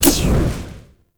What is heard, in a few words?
Sticky Bomb Throw; Grineer; Veilbreaker